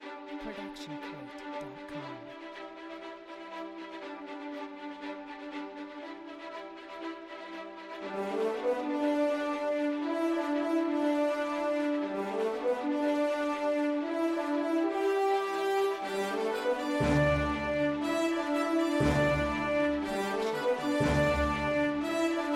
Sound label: happy cowboys, bpm 120; soundscrate